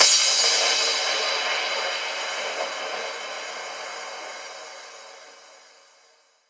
Crash, Cymbals; Vengeance Samples; VEH3 Cymbals

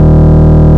Root D#, Bass

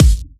Hard Kicks; Vengeance Samples

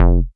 Synth Bass; Vengeance Samples; VEE Synth Shots

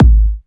128BPM Big Wobble Kick; Vengeance Samples

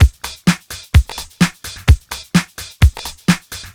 House Beat, 128 BPM; Vengeance Samples; VEH1 Loops

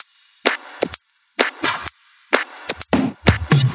Electro Loop 128 BPM; Vengeance Samples

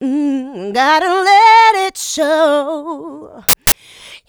voc, female vox, Vocal House; PS-28 Vocal House Samples

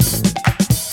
Fill Ins 128BPM; Vengeance Samples; VEH2 Loops

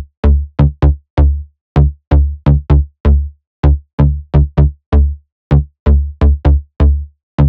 128BPM Bass Root D; Vengeance Samples; Beatdancer Kit

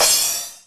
Cymbals crash; Vengeance Samples

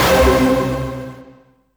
Synths C; Vengeance Samples

